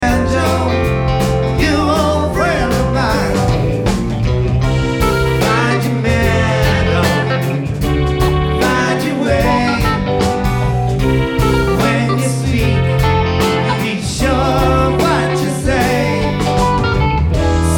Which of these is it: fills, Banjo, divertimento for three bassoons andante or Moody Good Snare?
Banjo